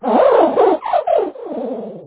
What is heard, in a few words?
cry; pawmot